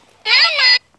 Toy Dolly Mum

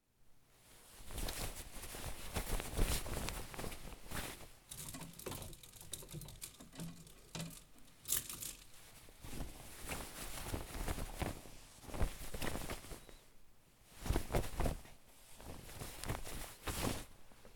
Fabric foley; Acoustic Memory

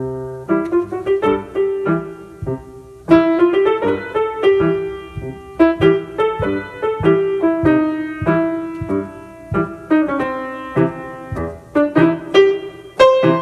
Piano Improv Prog Clumsy Raucous

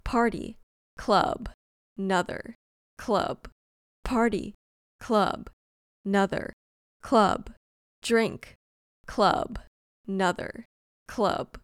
Looping Edm Female Vocal Sample

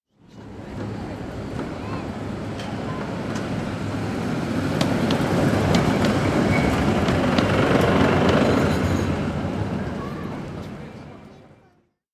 Tramway Passing

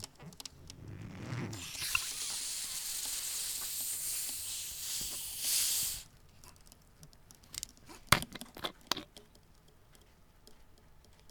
Scifi Airlock

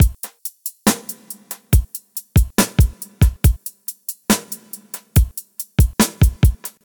70 Drums Rub A Dub

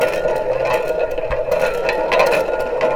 Slowly Rolling Can Looping

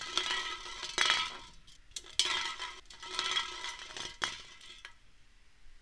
Can

